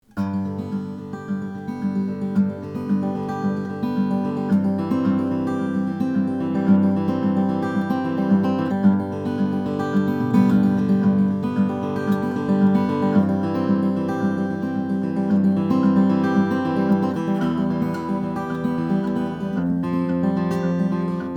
manzer steel string indian rosewood, Guitars Acoustic